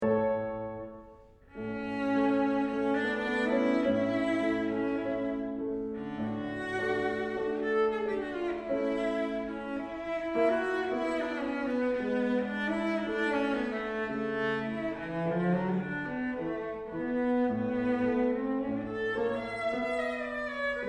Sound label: Haydn Concerto in D Major, cello